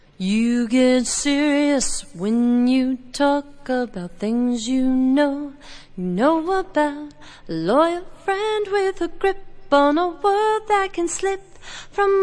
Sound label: harmonise (acapella)